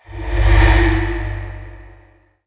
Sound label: open portal; boss umbra